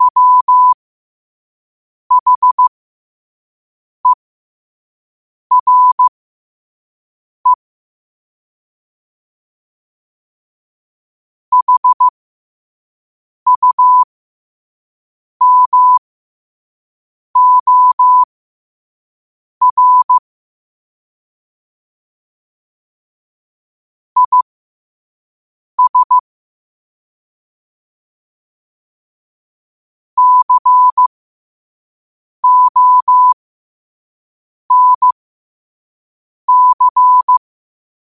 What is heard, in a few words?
5 wpm, morse; Daily Quote